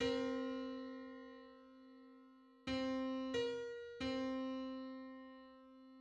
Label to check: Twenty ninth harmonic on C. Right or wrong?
Right